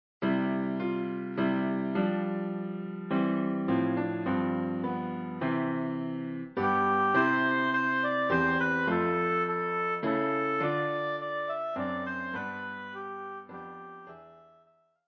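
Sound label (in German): We wish you a Merry Christmas Oboe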